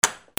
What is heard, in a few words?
DOORS